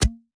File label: Water Splasher